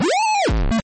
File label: Pitch sliding down and back up 8-bit